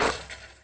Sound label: Street Ball Chain Swish; SFX Kit